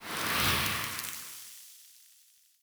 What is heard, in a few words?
Soul Mode Activate; Powers; Fey; Saboteur